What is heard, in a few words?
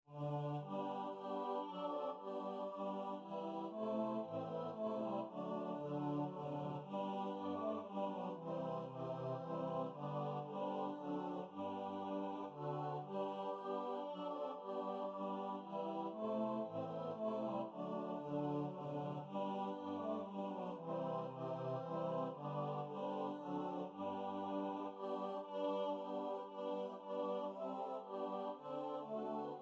baritone & chorus